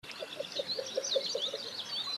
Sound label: BITTERN LEAST